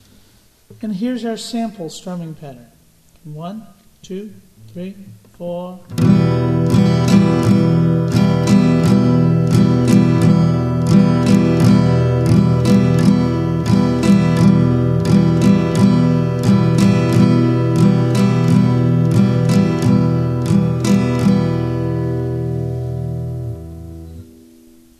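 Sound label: strum pattern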